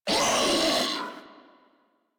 Proto Infested Ancient Player Spotted, Enemies Vocals; Warframe